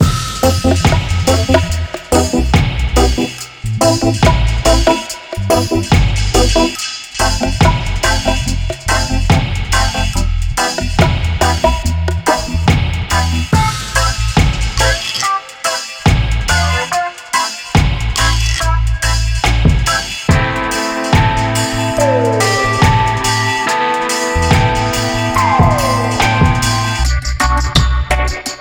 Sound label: Reggae Keys; MiniPak Series Vol 1